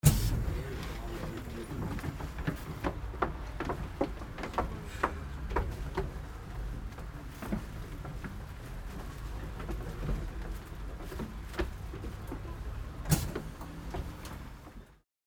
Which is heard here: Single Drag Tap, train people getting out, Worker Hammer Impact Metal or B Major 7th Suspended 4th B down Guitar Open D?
train people getting out